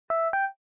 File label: success, phone tones; LG GD570 dLite